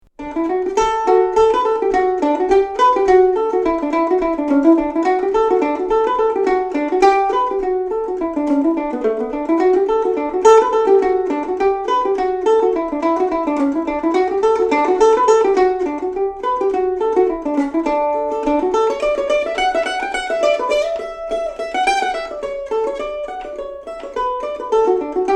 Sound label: Mandolin Feature; Roxannes Waltz and Whiskey Before Breakfast